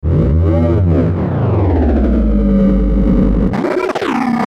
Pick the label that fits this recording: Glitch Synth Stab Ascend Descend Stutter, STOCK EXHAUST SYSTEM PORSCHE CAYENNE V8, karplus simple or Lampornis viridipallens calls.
Glitch Synth Stab Ascend Descend Stutter